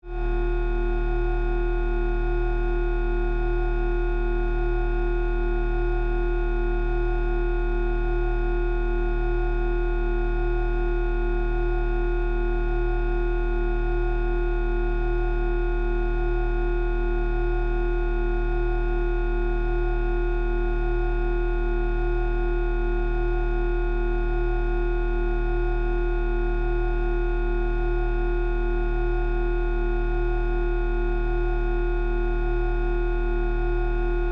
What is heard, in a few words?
Force Field Energy, Source